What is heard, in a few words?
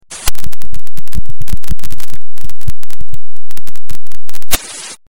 Window XP